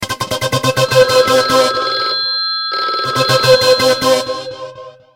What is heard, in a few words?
Morph Ring